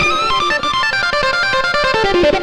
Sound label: xtra lead